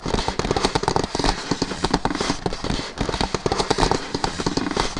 petarade

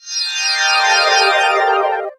glis desc mel

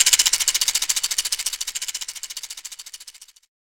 maraccas

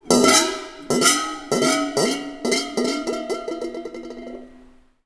cymbale ivre

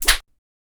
fouet

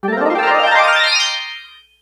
gamme brillante asc

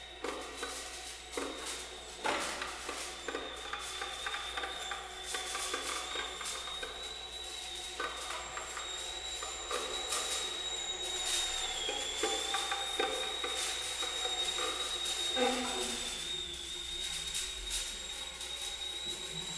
ligne point

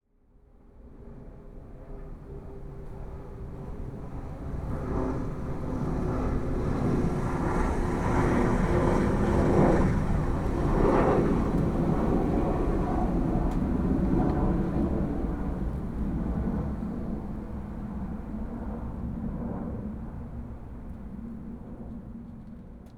avion loin

